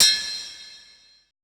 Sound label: HI TUBE, PERC; BPC PERC